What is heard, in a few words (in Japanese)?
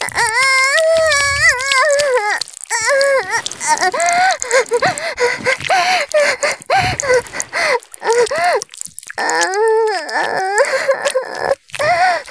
絶頂 おしっこ, ASMR; 騎乗位